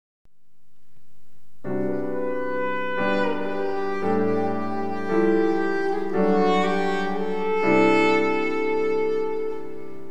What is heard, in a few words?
Soprano Saxophone And Piano; Jazz Composition; Jazz Composition Showcase 1998; CLCM Students